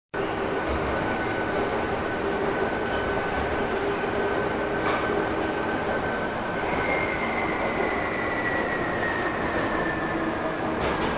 air subway; Soldier Of Fortune II Double Helix; XBOX

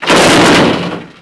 hit wood; WhiteOut

